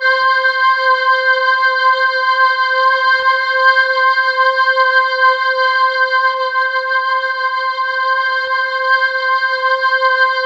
C5, Synth Leads

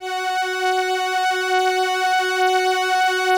LAMBDA, String Machines

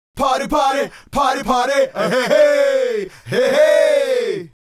Male Group, Hip-Hop and RnB Vocals

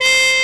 Collect; Pikiinya!

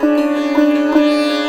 SITAR; Spectrasonics; Heart of Asia

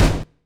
HIT 3 DRY; Bolder Sounds - Granular

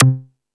House Machine, Drum Machines

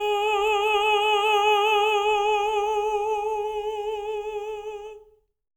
TENOR G#4; Spectrasonics; Symphony of Voices

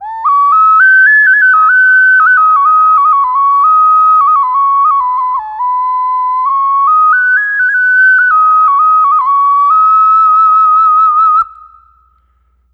Hi Occarina; Ambient; Clean Flutes

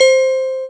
celeste C4; Greytsounds; Scott Peers Sound Engineering

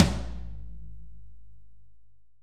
AMBTOM, ROOM AMB TOM

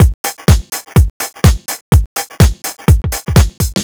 Electro Haus Fill, Drum Loops 125bpm; Nu Dance Electro

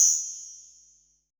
METAL, PERC; BPC PERC

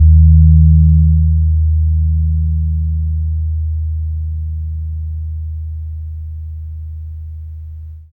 SEWUKAN E B3, GAMELAN SET; Spectrasonics; Heart of Asia

